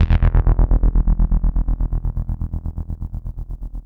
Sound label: bass chopper, Drum N Bass; Sonic Foundry (Sony Creative Software); Knutrix Drum & Bass